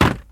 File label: old wood land; Golden Crayon Sounds